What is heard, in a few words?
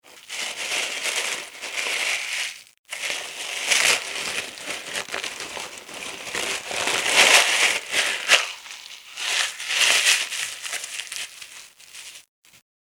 Scrunching Tissue Paper; Crinkling Sound Effects; Andrew Fleming